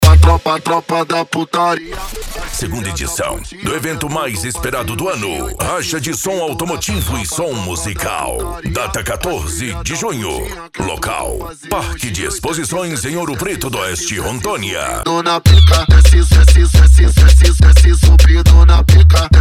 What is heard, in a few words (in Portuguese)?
racha de som automotivo e som musical